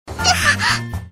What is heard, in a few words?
stabbed; freak fortress 2; sagiri